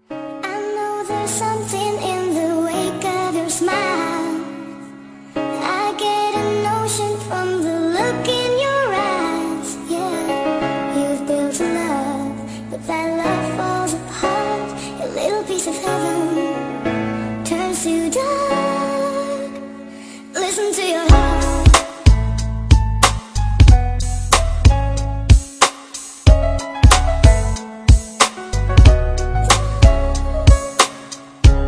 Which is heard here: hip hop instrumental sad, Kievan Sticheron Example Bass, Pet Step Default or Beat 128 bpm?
hip hop instrumental sad